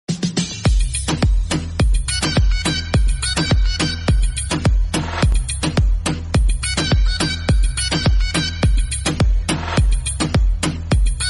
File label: instrumental ringtone song bgm ringtone instrumental ringtone